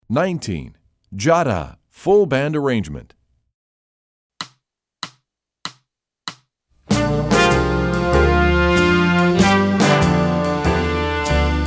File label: full band arrangement with syncopation